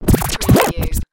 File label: Turntable Scratching